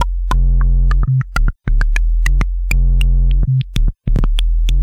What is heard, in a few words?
Filter Gate Beat, Electronic Beats; Sonic Foundry (Sony Creative Software); Myths of Technology